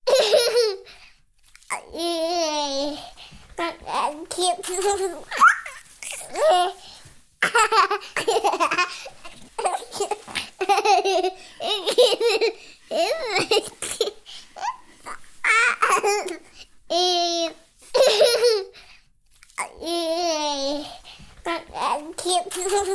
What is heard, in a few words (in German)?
Lachen Singen